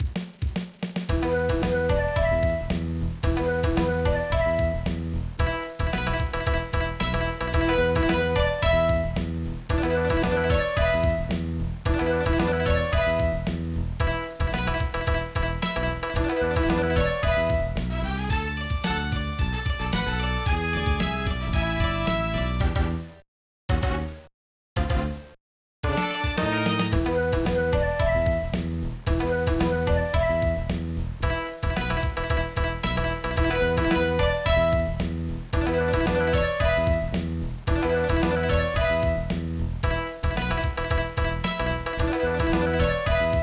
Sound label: BGM; Shimura Ken no Baka Tonosama Bakushou Tenka Touitsu Game; GBA